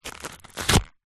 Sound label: foley card rip egg box